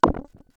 fighting game source synthesized sweetener ui button press